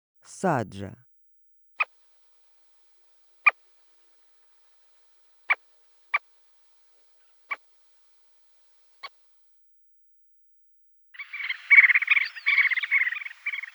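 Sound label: Pallas's sandgrouse voice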